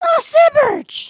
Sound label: sway, emotes